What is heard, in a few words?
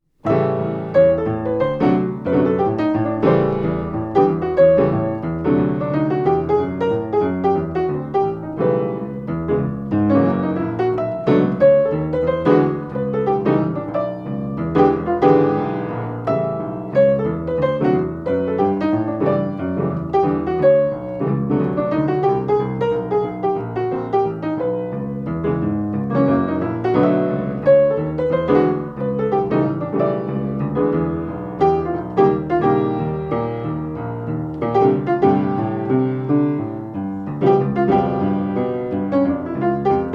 rough piano